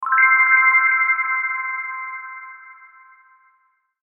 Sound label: Musical Bell Twinkle Delay Reverb; Bells; Blastwave; Sound Effects:Musical